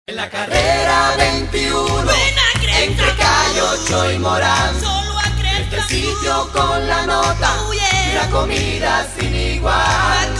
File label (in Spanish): jingle cantado a capela; creeps and food